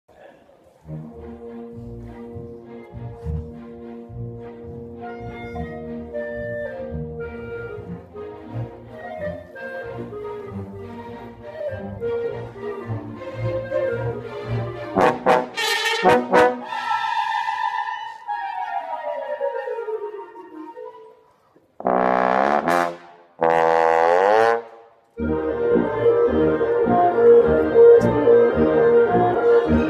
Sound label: trombone glissando solo; Bartok